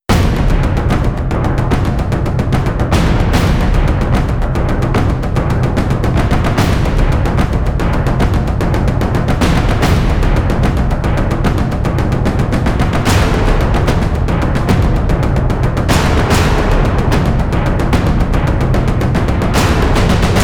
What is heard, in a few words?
drums